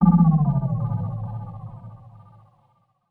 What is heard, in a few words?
SPACE FX 140; SpaceSounds